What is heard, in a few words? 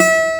ACGUIT A3, BassGuitar; AKAI S6000 CD-ROM - Volume 1